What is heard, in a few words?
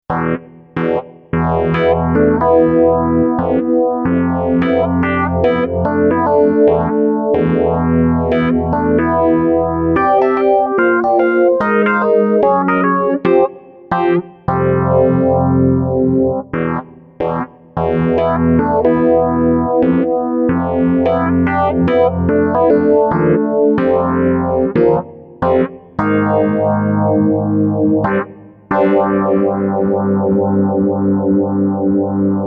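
phase electric piano with overdrive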